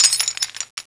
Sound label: chain Rattle; POOL CHAMP